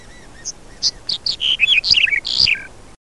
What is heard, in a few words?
Emberiza melanocephala; Bruant mélanocéphale